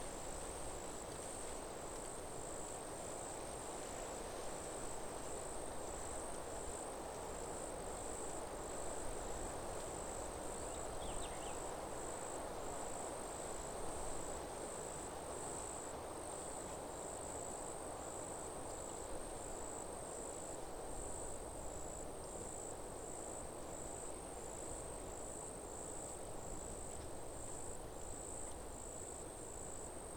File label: forest slight wind